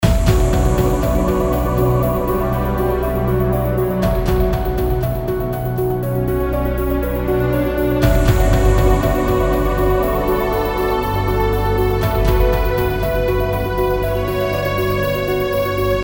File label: menu sci-fi